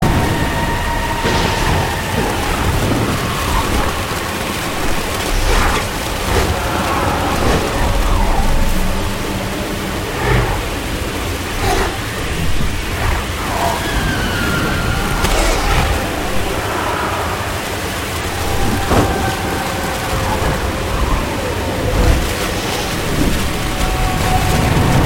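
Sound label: Ambience Blizzard; Dementialand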